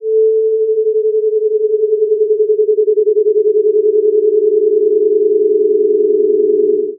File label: glissons fall